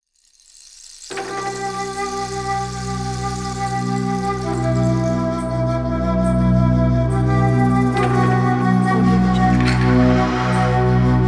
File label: ninja ritual